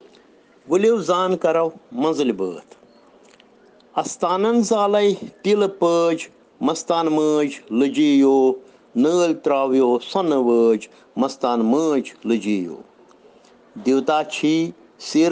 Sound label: Children Rhyms in Kashmiri